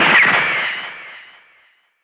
Gunshot motif

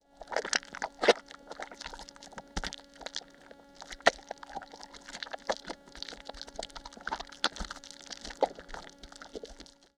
squashy sticky middle

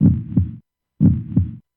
HeartBeat slow loop

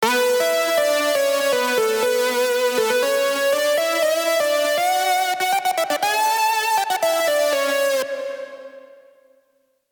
reverb lead

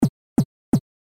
8bit kick

